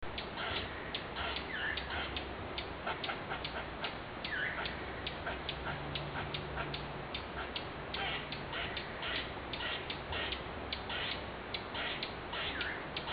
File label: forest